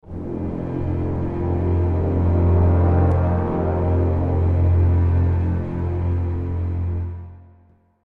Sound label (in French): attaque grave; Un petit effet très basique et certainement très utilisé, est d’ajouter une note de percus